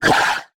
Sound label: Multimedia Internet CD-Rom Flash Blast